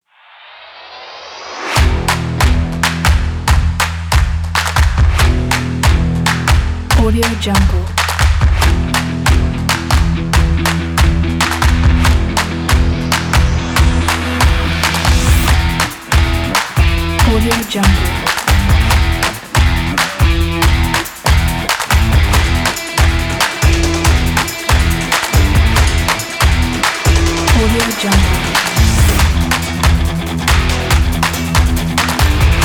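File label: stomps claps rock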